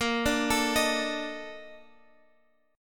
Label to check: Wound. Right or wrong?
Wrong